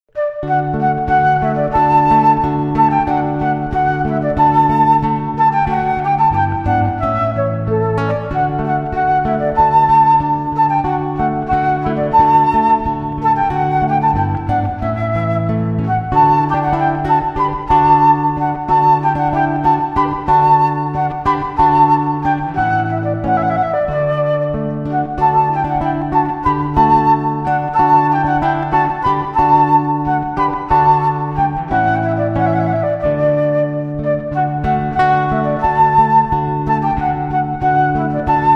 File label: flute guitar spring